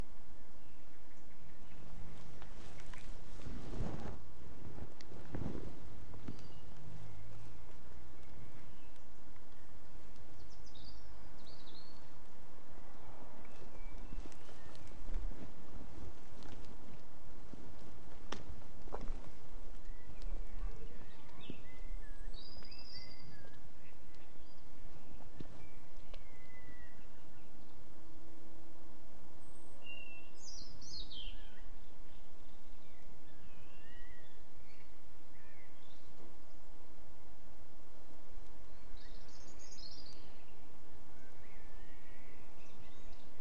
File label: dusk birdsong near my flat stereo soundscape blackbirds i think